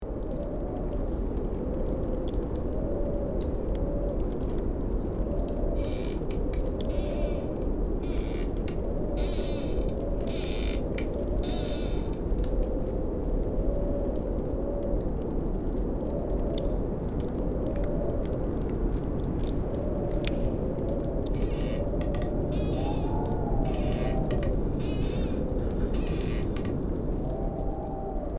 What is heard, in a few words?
Mountain cabin horror ambience exploration loop